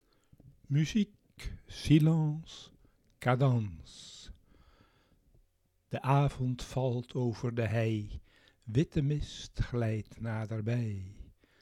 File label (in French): musique silence cadance; luistergedichten